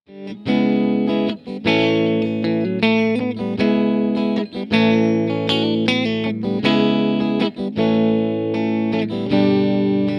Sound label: TC15 Clean, P90; Munch Hi; hi INPUT